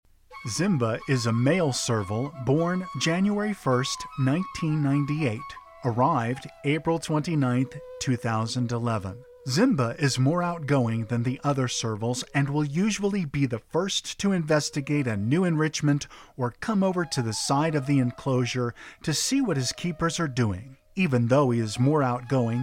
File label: Serval; Zimba